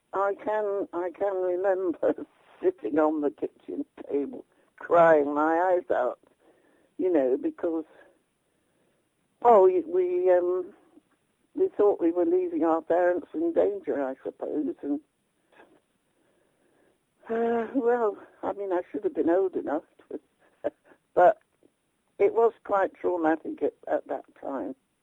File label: crying before evacuation